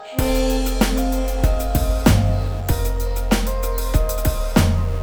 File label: Walking Irrevocable